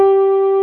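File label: reverse piano G2; AKAI S-Series CD-ROM Sound Library VOL-2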